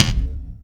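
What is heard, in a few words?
bass drum, right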